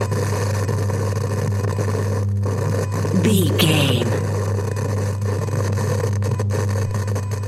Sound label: radio tv electronic static hum loop